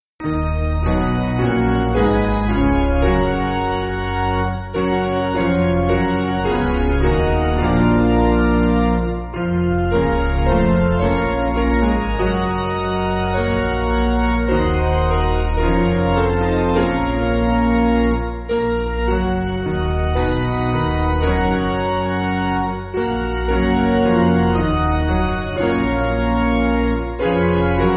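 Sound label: Piano, Backing Files for Churches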